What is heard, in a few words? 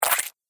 Cartoon Slime Splat; Slimy Sound Effects